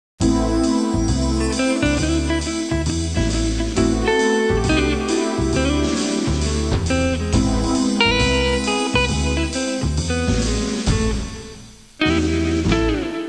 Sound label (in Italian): musica urban